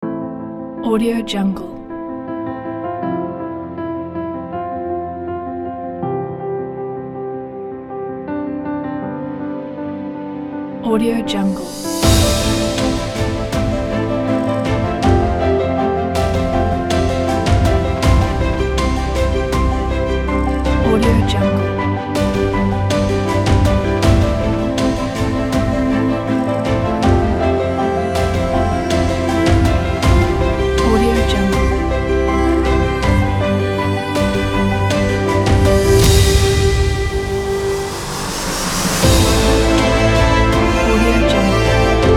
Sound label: inspiration uplifting cinematic